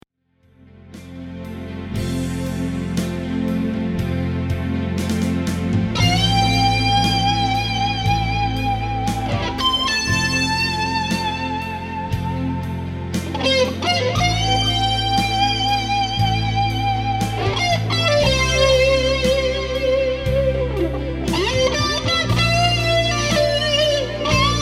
BRICK MUFF TubeDriver Hartman Flanger TC Nova Delay Solo